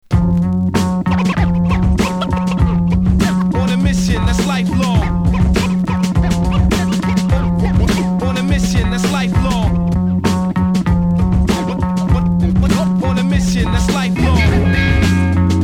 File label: Remix Instrumental